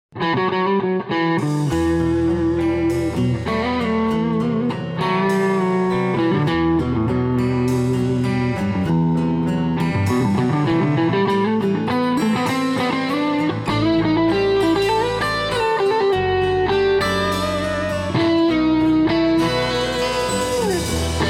Gibson ES355 PAFs

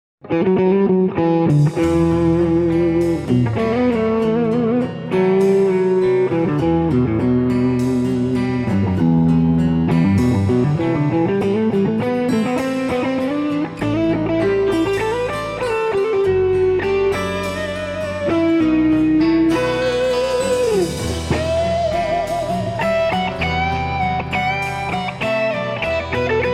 Heritage H150 Premium Clone PAF set

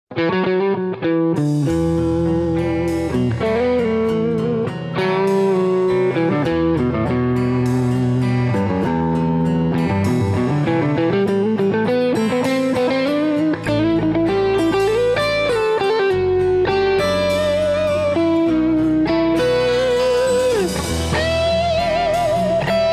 Heritage H150 Tyson TTL

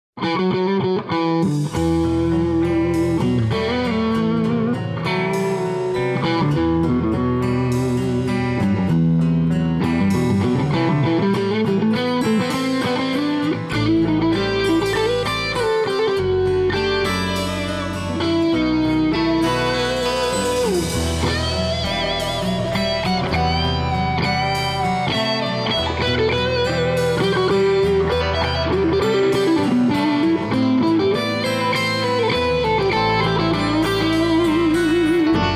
Heritage H150 Buzztones

